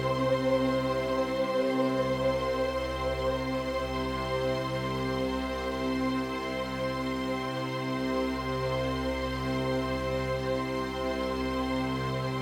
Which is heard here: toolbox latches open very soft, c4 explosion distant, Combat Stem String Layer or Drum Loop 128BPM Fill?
Combat Stem String Layer